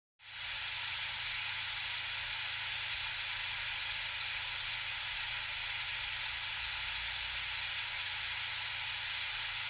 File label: Violet Noise Sample